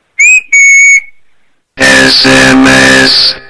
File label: Robot Sms